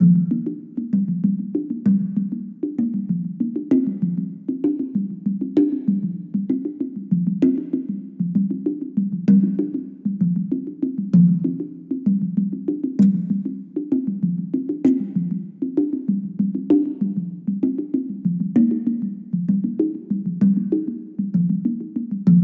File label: ambience Music; temple of nudges